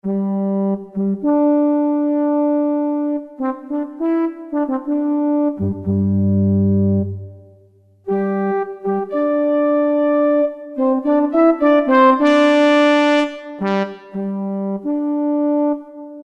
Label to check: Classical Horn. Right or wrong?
Right